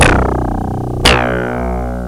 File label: CS15 BASS; OLD SYNTHS; Rubber Chicken Software Sample Bank